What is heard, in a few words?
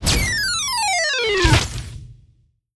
Defeat; HoneycombRush